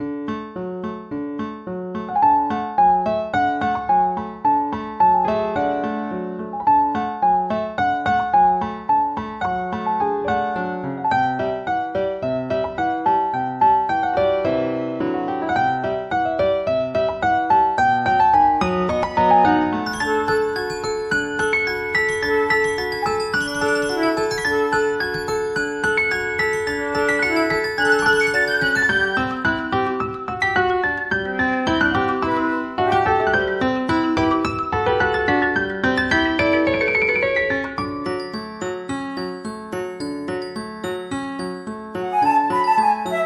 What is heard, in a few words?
bit